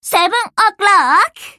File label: clock